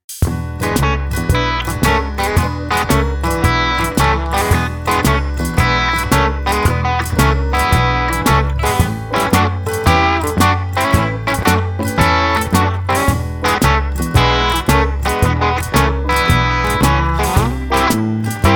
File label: red hot rockabilly guitar; weeklybeats 2024